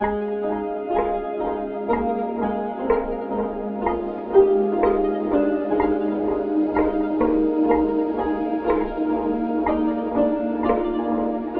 dreaming bells; ゆめ2っき

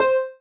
marimba rainbow; ゆめ2っき

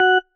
marimba tissue; ゆめ2っき

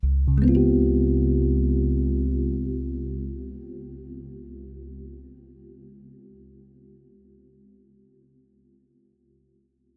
pseudo pulsar; ゆめ2っき

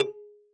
marimba kalimba; ゆめ2っき

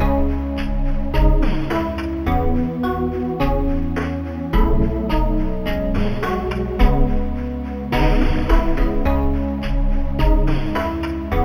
Snare Loop; ゆめ2っき

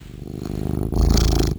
cat purr

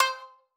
marimba shamisen

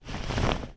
bed

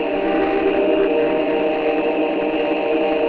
atmos; ゆめ2っき

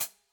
marimba drum; ゆめ2っき

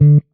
marimba; ゆめ2っき

